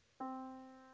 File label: yamaha Disk